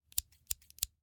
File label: Scissors, snap, snip, shear, small med, triple; Eiravaein Works; Vaeyan IV